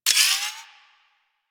Lev Buff Power Up; Warframe; Warframes; Trapper

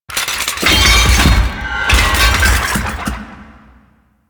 Necramech Ranged Death Fall; Enemies; Warframe

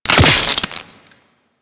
Head Shot Metal, Bullet Impacts

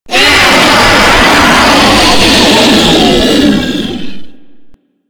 Hammer Boss Death, Vocals; Warframe

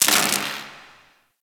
Gas Robot Repair Spark; Ambience; Props; Warframe; Corpus Gas City

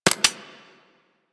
Laser Aim Rifle Alt Mech C, Weapons; Grineer

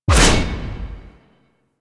Melee Heavy Attack Short Blade Whoosh; Warframe; Weapons; Whooshes

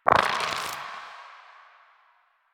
Dice Roll Five; Warframe; Koumei

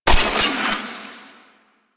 Shotgun Reload; Warframe; Pax Duviricus

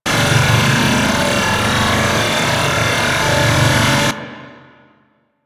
Recharge Station Loop; Ambience; Props; Warframe; Corpus Infested

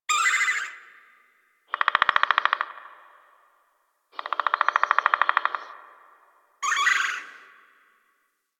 Tree Critters; Warframe; Ambience; Eidolon Plains; One Offs

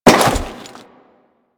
Crewman Step Default, Footsteps; Warframe; Corpus

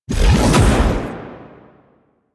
Sphere Cast; DE; Warframe; Warframes; Gyre

